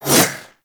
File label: Kunai Whoosh; Warframe; Weapons; Stalker Kunai